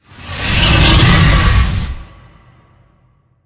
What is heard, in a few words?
dragon kill move, dragon attack breath; npc; soa